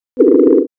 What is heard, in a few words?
cluster sine